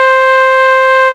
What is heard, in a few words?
Synth flute; instrumente